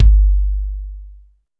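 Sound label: bass drum long, acoustic drum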